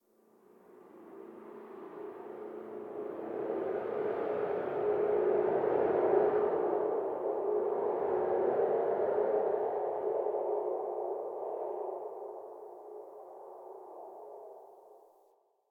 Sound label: Dark Wind